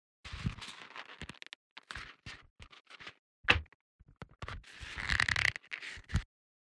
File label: Ranger movement